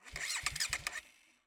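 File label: KTM RC8R onboard false startup engine; DPA4062; Pole Position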